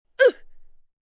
Pain Uh Short, Grunt, Impact, 1 child, short; VoiceBox

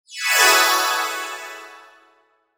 Pixie Dust Lush Chimes Descend Reverb; Magic

